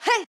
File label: Swipe; Jackie; Going Under